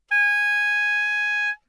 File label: A sharp 5, soprano saxophone